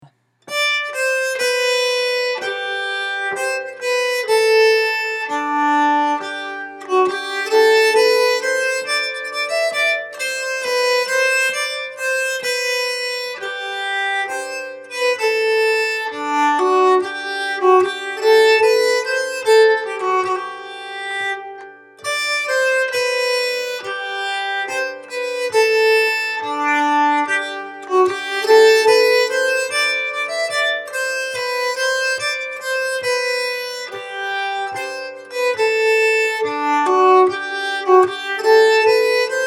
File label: voix, nyckelharpa